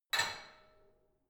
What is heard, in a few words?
barbell only lift up soft, fitnessstudio